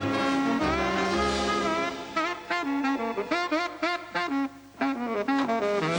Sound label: solo